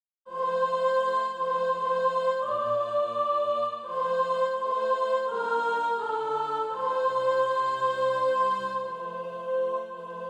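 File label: soprano, SATB Practise Music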